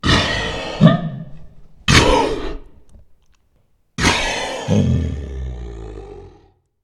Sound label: Monster Cough